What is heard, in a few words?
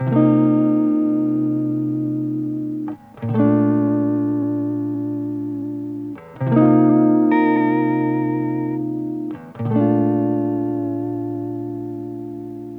Electric Guitar - 75 BPM A Maj, dry; Melodics; Cymatics; Eternity Sample Pack; Guitars - Electric